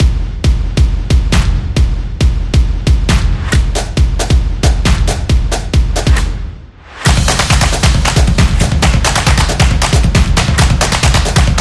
This Is Drumming, stomps and claps

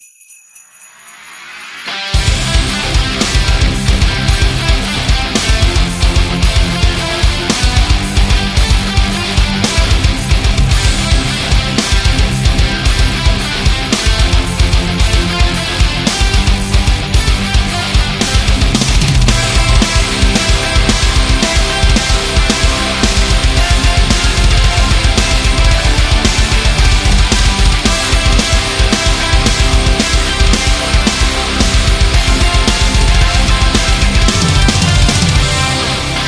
Christmas Rock; xmas

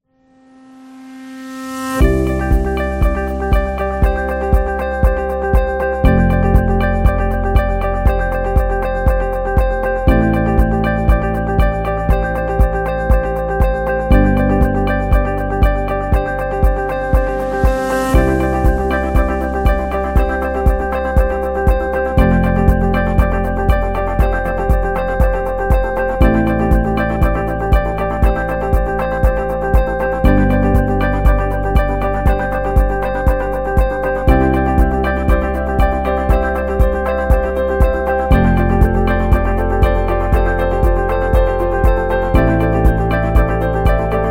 Becalmed, corporate music; corporate music vol 3

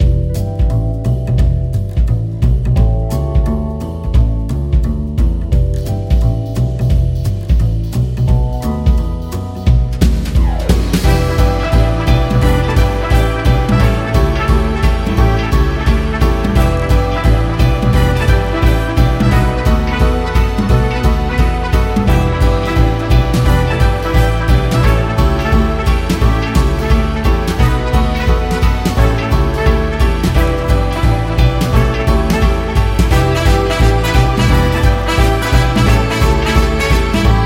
Funk Jazz; jazz club

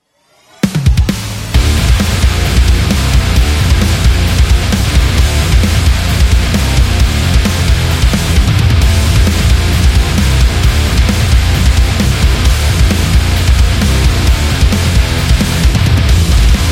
Modern Rock; gym rock

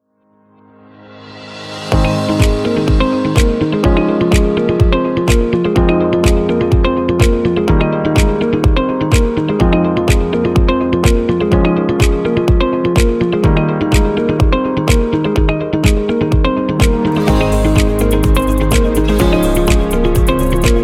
Upbeat Instrumental; eco-friendly

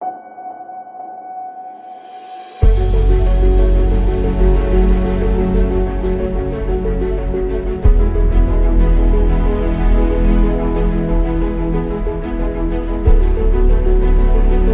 Slow Dramatic; planetarium

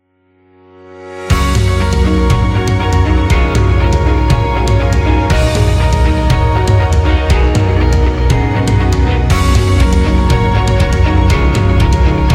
A Motivational; motivational speech

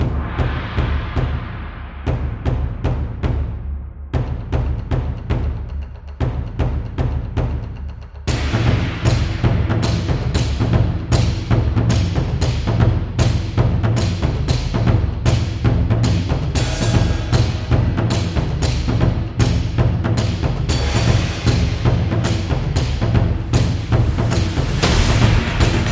Japanese Drum; tribal dance

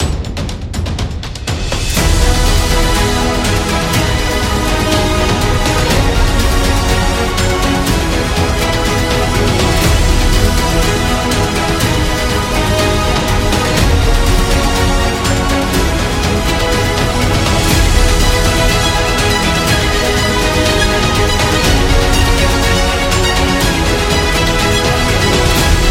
News Background; epic action

